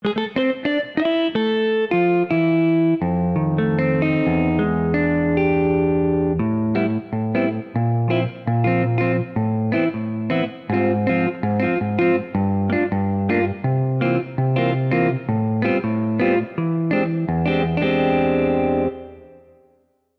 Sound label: Jazz Guitar - Bright Studio; OldSkoolVerb